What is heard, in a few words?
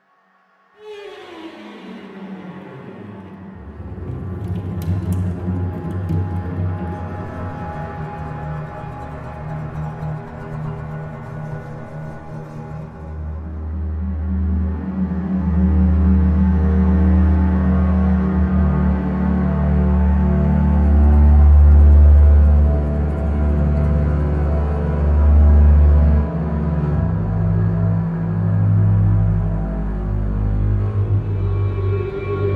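criminal music without words for background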